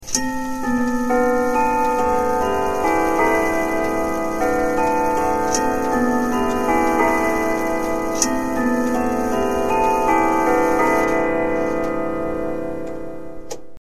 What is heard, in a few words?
Wittington 3 quarter hour, grandfather clock; Grandfather Clock Co.